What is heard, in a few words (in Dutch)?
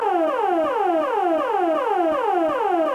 geluid brandalarm; kleuter